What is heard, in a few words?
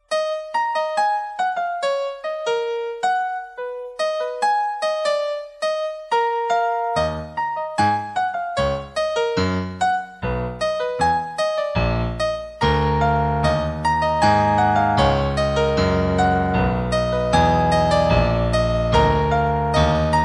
piano